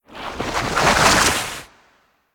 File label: morph whoosh; Soundrangers